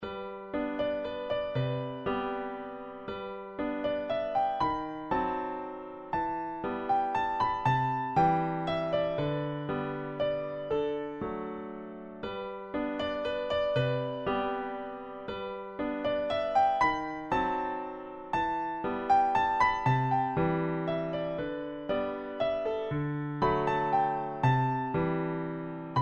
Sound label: Level 4 Piano Midi